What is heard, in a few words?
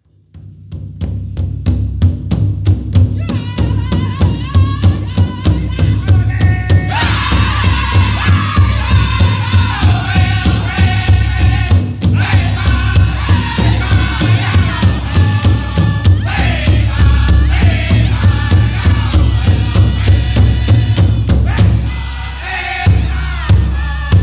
Double Beat